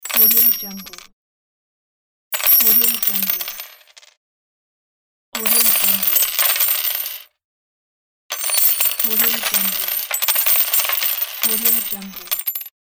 slot machine payout

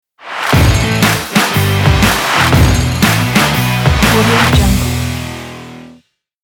upbeat rock logo

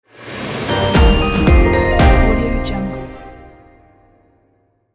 china award

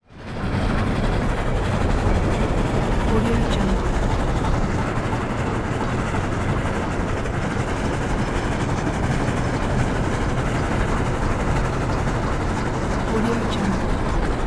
train steam

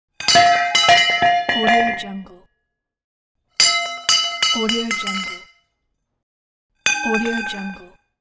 dropping metal pipe on concrete floor

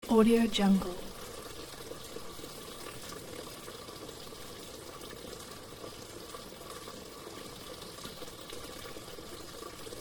fountain sound

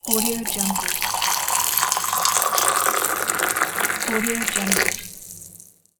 i pour boiling water into a mug; asepticsounds

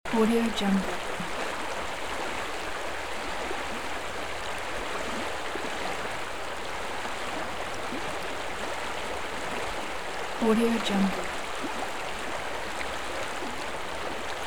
calming river ambience

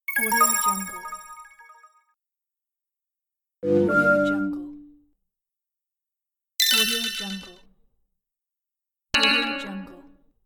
phone notifiers

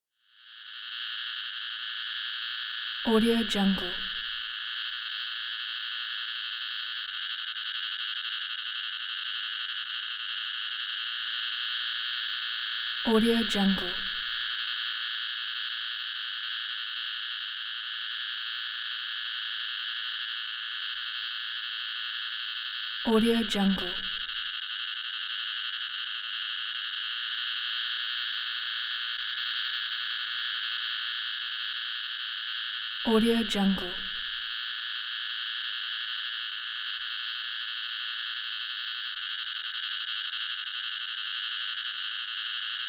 cicadas half speed intense static sizzle

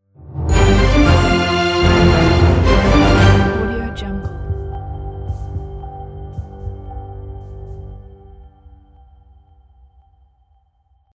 powerful breaking news orchestral logo; stanismusic

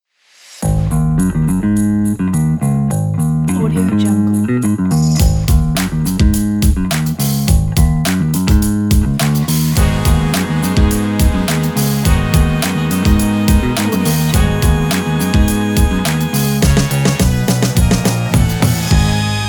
retro funk logo

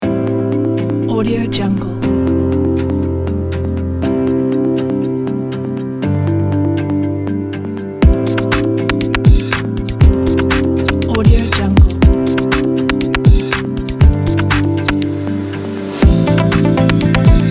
corporate ambient

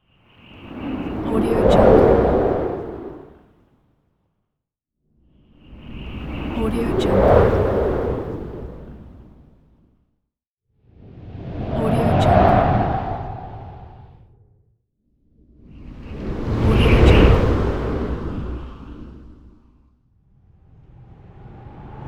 wind whoosh

